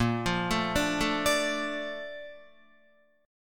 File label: Bb Major 7th, Bb, down, Guitar; BbM7 arpeggiated; JGuitar